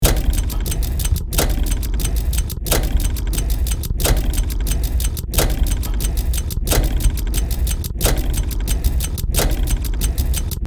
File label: Hydraulic pressing machine loop